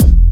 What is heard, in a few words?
kick, ragga dub reggae; USB Soundscan vol.27; AKAI